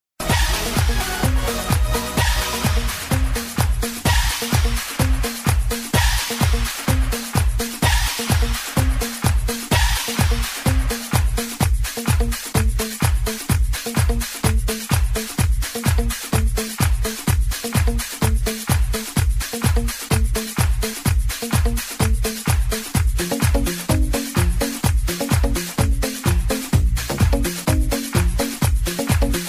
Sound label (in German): Musik für lauf Laufen Fitnessstudio Trainingsübungen Musik für Sport Bewegung